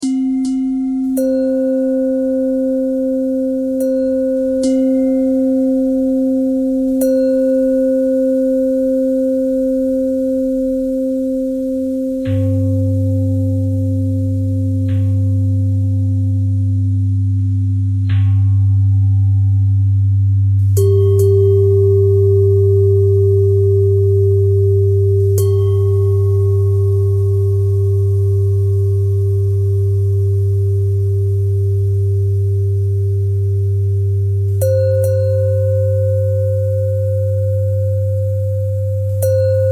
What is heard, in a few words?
bell bell thread simple, bells